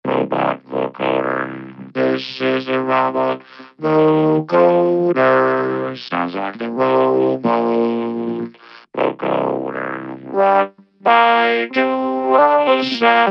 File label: Robot Vocoder